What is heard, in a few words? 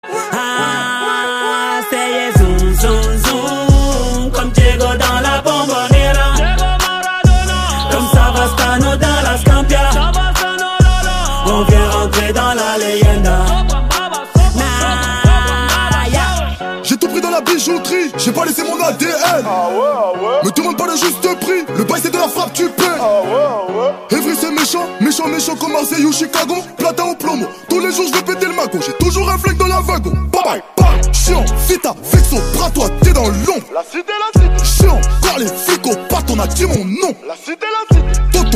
Soprano